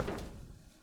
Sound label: Submarine Step